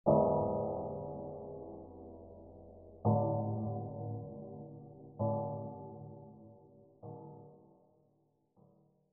bell tibet; amb; angband

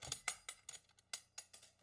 chest unlatch; angband

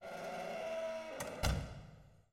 door creak shut; angband

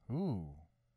"ooh"; angband

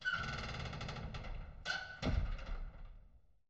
door dungeon; angband

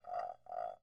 ceramic trill; angband

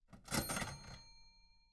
bell register; angband

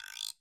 tone guiro; angband

